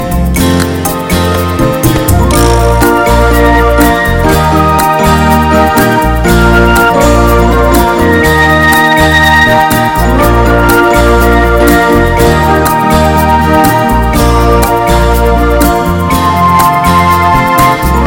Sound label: Sweet Sounding Music